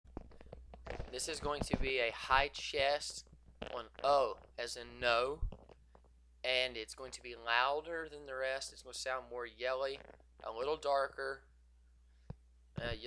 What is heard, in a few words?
High-Chest OH